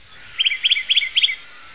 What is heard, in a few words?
Titmouse; Automne